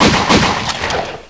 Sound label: gunshot